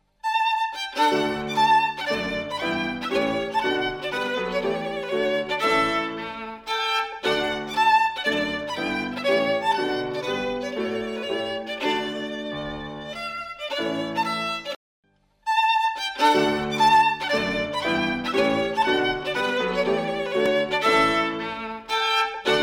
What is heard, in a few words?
three violins